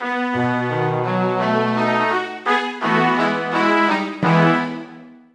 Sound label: Hungary, Fanfare; 1997 MMX LAN PACK (win 95); Worms Armageddon